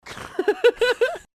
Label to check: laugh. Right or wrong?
Right